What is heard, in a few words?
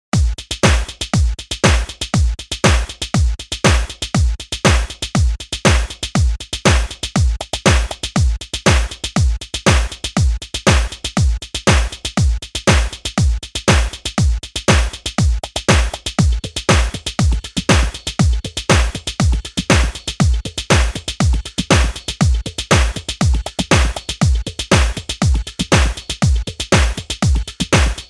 beats loop track bpm 120